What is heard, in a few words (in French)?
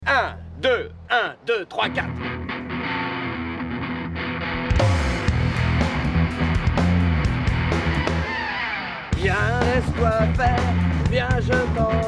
musique chanson